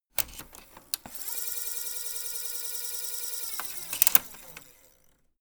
servo move high

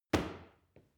paw kick soft

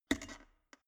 plastic water boiler open lid soft

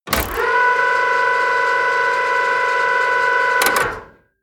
fire truck ladder rotate

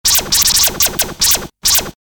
laser zaps

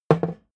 hammer wood bounce

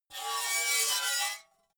metal scrape rich short

